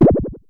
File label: Synare Filter; Star Instruments Synare 3